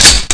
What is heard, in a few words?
slide release, weapons